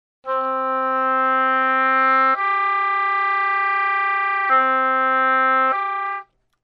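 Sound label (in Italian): oboe Suoni Doppi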